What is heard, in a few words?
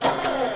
rm machines